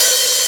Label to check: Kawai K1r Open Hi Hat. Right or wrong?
Right